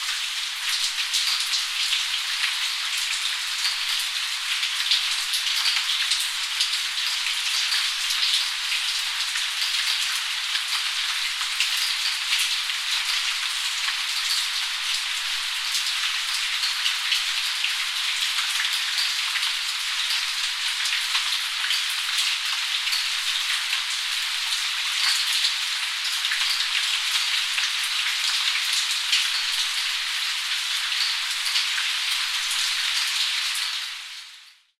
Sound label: rain